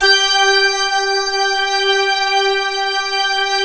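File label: synthesizer, digital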